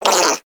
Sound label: Armadillo Vocal Pain; Warframe; Characters; Hunting Critters